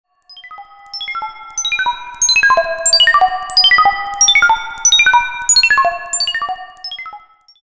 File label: Jumper reverb